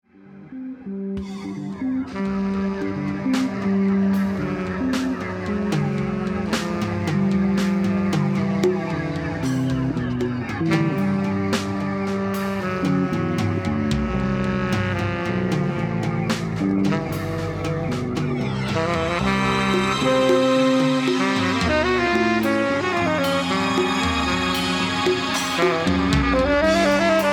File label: just a riff